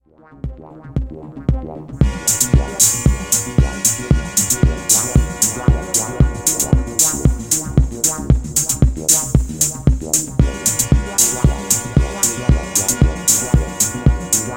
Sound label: rhythm